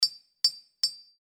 heat up

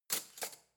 cutlery spoon drawer place

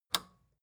record player power release off

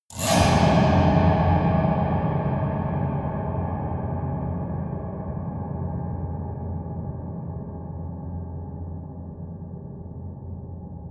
piano twang multiple fast long

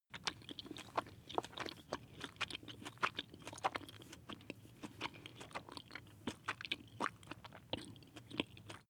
chew jellybaby male

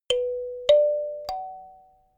notification kalimba triad up minor high

